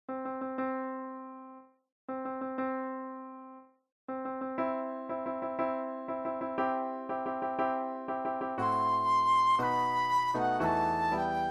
Wedding March Flute Solo; classical; Mendelssohn

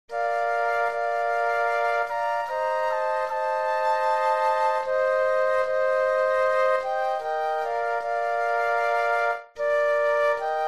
Flute Trio; Santa Lucia

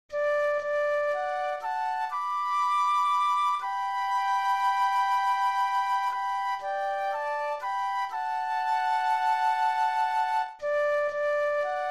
On Top of Old Smoky Flute Duet

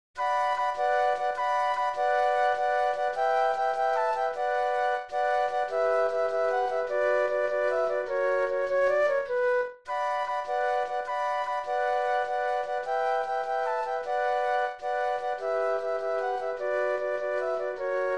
tarantella flute trio; Tarantella Napoletana; folk trios

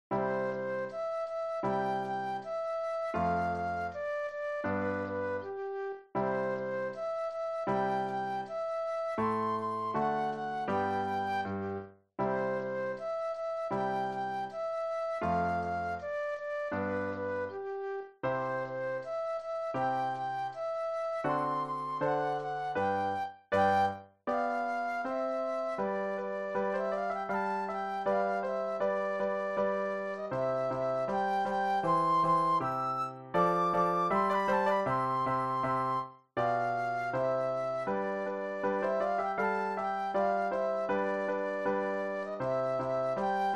Andante Flute Solo; classical; Haydn

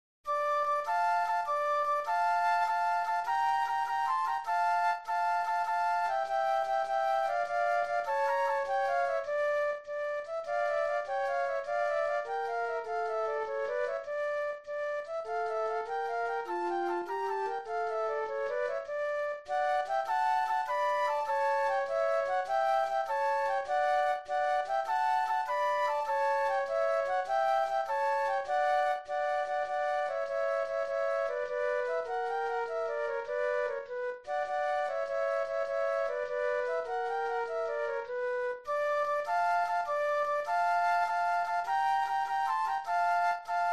tarantella flute duet; Napoletana

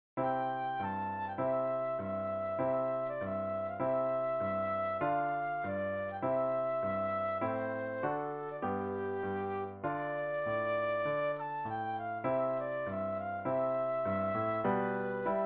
Flute Solo; classical; Bizet; Toreador Song

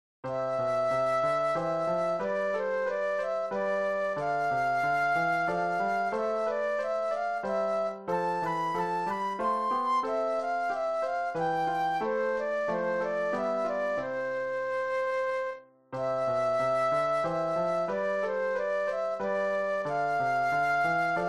Rondeau Flute Solo; classical; Purcell; Z. 629

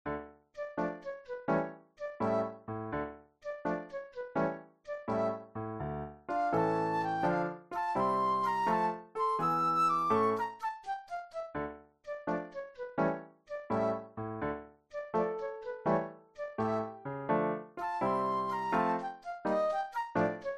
Funeral March Flute Solo; classical; Gounod

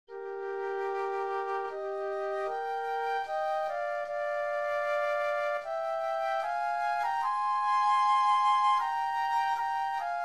Adagio Flute Duet; classical; Mozart; K. 439b

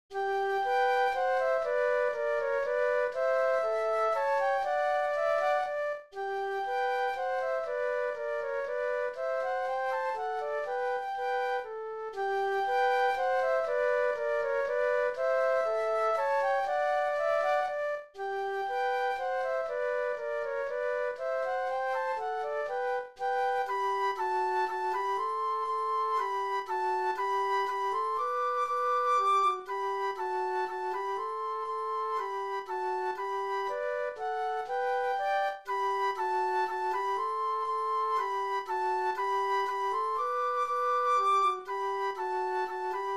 German Dance Flute Duet; classical; Haydn; Hob. IX 22 no. 3

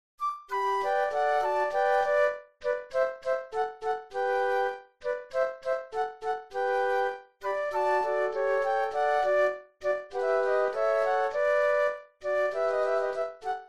Allegro Flute Trio; classical; Mozart; K. 3

